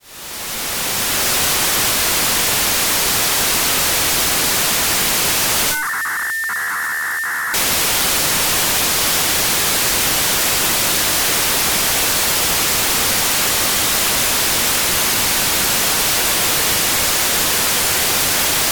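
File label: LAPAN A3, satellite; AIRSPY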